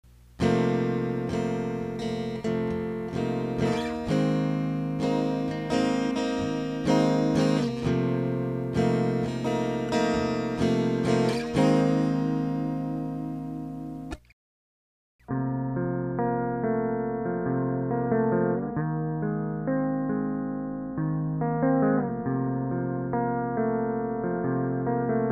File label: test lowpass 1750